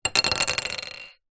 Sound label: plastic object hollow small down on surface; foley